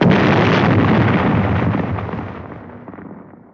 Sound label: xplode